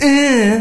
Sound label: Eeeehhh